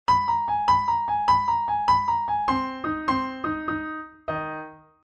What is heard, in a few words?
Blues Beginnings